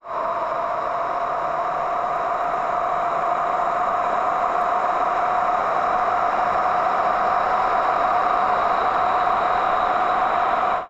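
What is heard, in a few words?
pass By forwards AVAS, EV2 AVAS Only, electric vehicle with a more subtle noise-based AVAS; model Y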